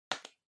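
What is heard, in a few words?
TV Hardware Remote Control Button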